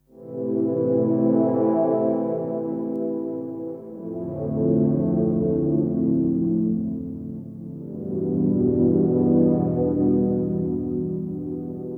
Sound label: boil pad ambi